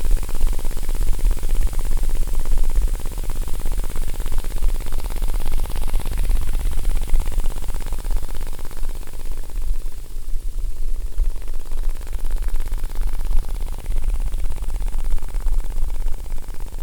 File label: helicopter; bricks in motion sound library